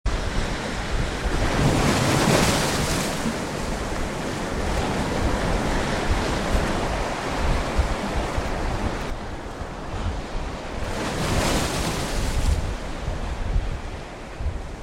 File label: sea; organicsound